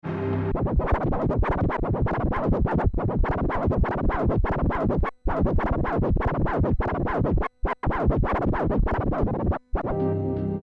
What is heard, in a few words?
Pioneer DDJ SB3 Scratch